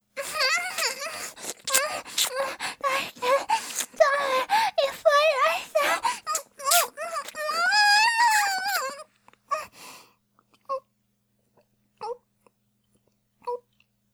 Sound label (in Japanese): ごっくん; Honey Drop